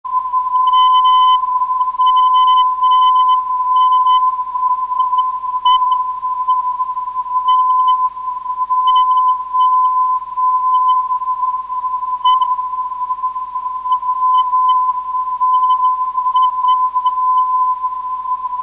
358khz

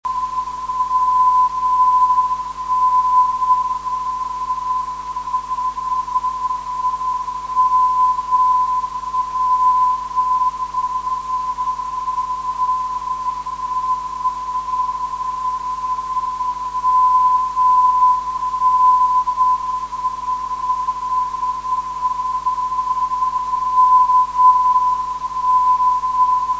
mn 462.7khz, ndb

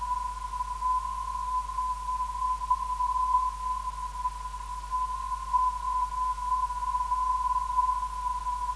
397kHz, ndb; EHN

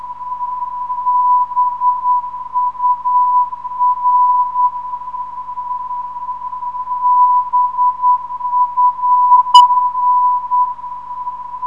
421kHz, ndb; BUR

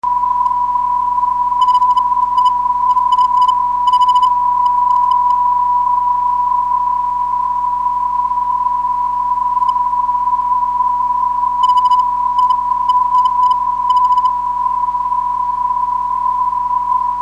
test 381kHz, ndb

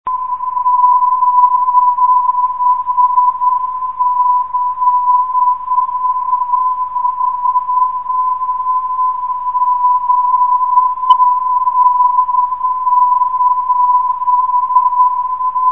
333kHz, NDB; gfc

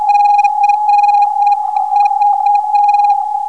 397kHz, ndb

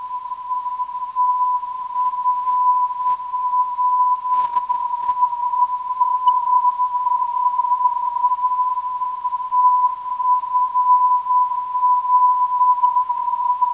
490kHz; TFR

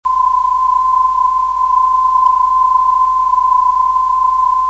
351 kHz, ndb; cyp lbn syr ndb; bod

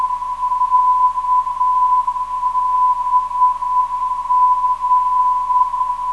310.5kHz, NDB; KBG

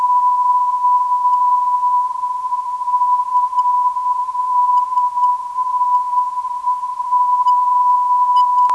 day 359.5kHz, ndb

